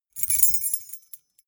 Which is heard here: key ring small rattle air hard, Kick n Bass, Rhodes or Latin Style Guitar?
key ring small rattle air hard